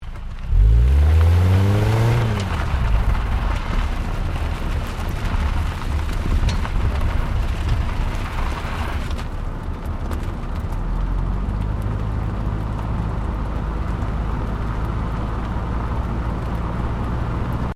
CAR TOYOTA COROLLA ACCEL EXHAUST GRAVEL LOOP STEREO, hatchback; 2009 Toyota Corolla hatchback